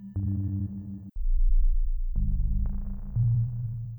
portal exit; 0°N 0°W

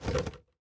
Bollard Raise Stinger; Going Under

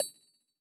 Pick Up Styxcoin

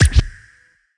Click; Subway Surfers City; Android, iOS; Click Skin

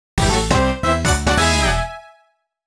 Start Your Engines; Mario Kart DS

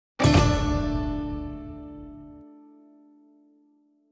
stinger Weakpoint Destroy

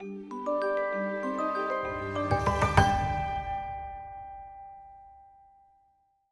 stinger Blessing Upgrade

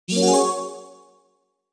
Checkpoint; Rally Racer EVO; Android

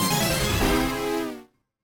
Failure Fanfare

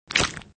bloodshoot; SFX Monsters

